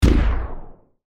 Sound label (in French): echec sort